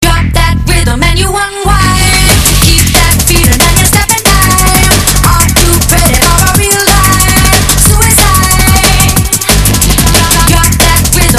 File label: Drum, DNB